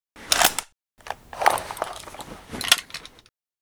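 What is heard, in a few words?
infantry weapons foley; Red Orchestra Ostfront 41-45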